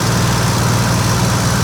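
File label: Plane P40 Interior Inflight Loop; SFX Kit; Airplanes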